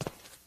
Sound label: Footstep Rock